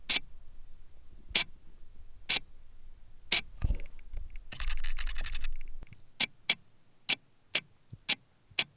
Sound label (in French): FERMETURE DIAPHRAGME